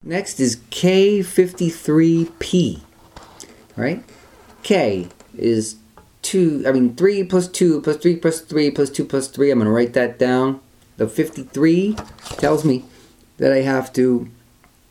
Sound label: rhythm generator 2 bar example